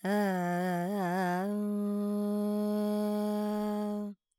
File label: Lydian, Vocals, Carnatic Female, Alaps, Dry